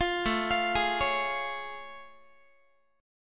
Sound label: A Augmented Major 7th A Guitar; A+M7 arpeggiated; 20,17,20,18,17,x